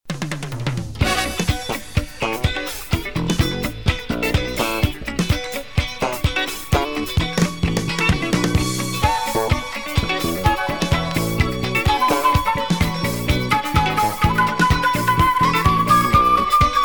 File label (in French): musique carte